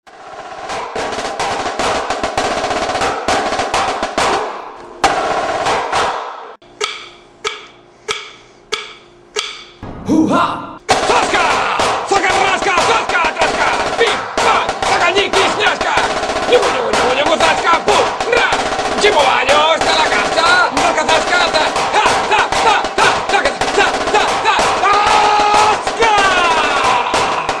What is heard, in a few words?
drums